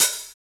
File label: HH, Dance Mix